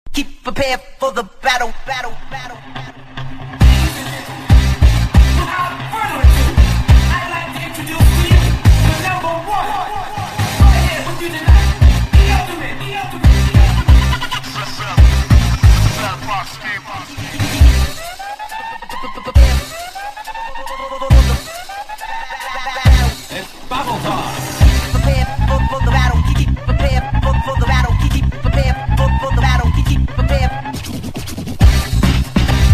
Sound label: cydonia music